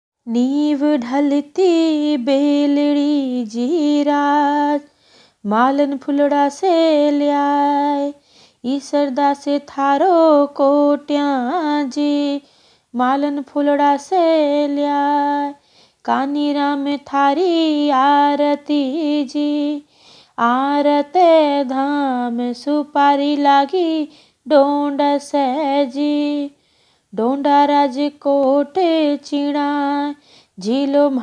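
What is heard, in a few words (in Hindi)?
गनगोर आरती; गनगोर गीत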